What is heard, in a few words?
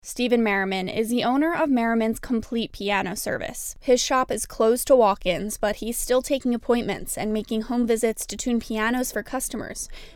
piano; merriman's piano wrap; wvpe